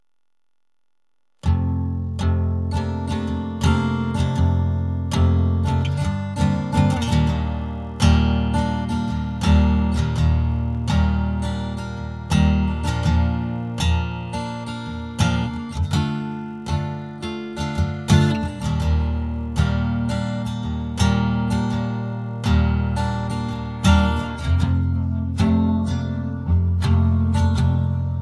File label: no vocals